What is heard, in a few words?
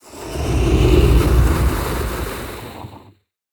Whoosh